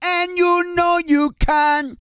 REGGAE, Vocal Planet World Voices

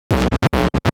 Scratch Fills 140BPM

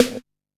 Snare, Drum & Bass

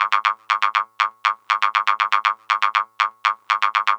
Techno Synth

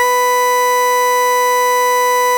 FARFISA ORGAN

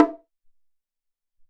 Bong Lo, Alesis SR-18

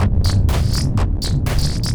Trip Cloud, Noise Percussion, Ethereal Textures; Sonic Foundry (Sony Creative Software); Ma Ja Le

